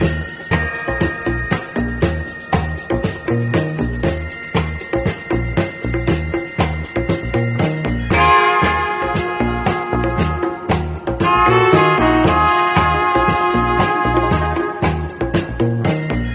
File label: horns, Loops, Phonky